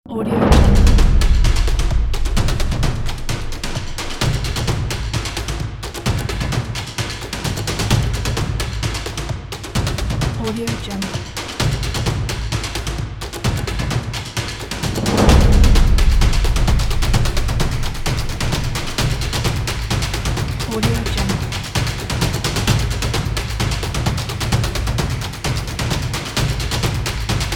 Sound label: percussive energetic tribal drums